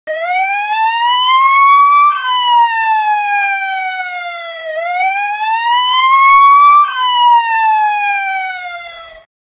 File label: realistic police siren sound effect emergency vehicle alarm